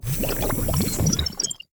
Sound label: relic flowmetal; Stellaris; grand archive; relics